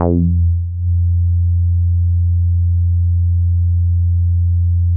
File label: Synths BASS